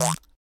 Squeeze, notifications Fun; Samsung Galaxy S24

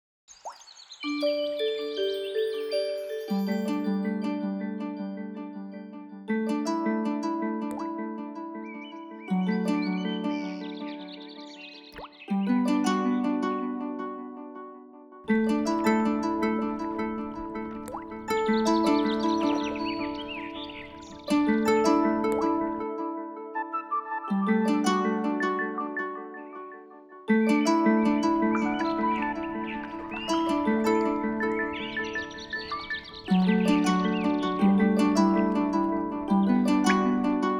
Open Forest, alarms; Walton; Primo-NF2-Plus

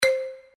dial tone marimbas; OnePlus One China

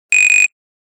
Buzzer, Nokia; Nokia E51

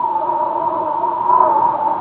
bounce fast; Bounce Touch

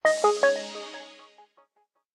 Power Off; LG; Optimus L9 P769

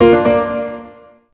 Front Panel Close, Pro Slide; Siemens; SL75

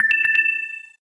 Low Battery; LG; F520L-AKA

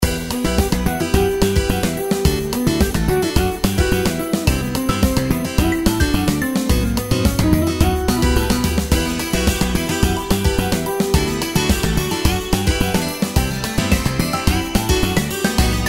SAMBA; ZTE; E850

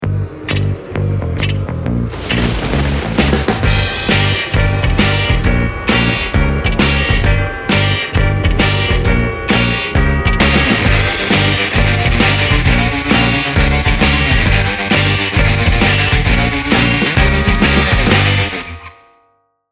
Rock Demon, ringtones

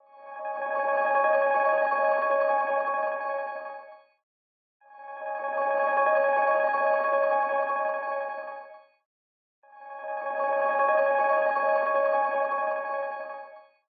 alarms abstract; Amazon Fire-Phone

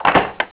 unlock lock; Nokia; 5800 XpressMusic; Bounce Touch

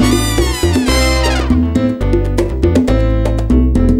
Latin, Club Cubano; Google; NewWave Labs

